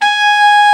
BRA TENOR, SAX; Best Service Gigapack 1; BR SAX 2

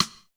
Stick, Drums, Roland JV 1080

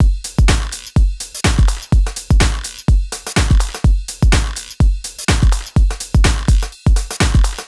Full Loop 125, Loungin House; Equipped Music

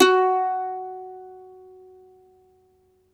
MANDOLIN, Plucked Strings

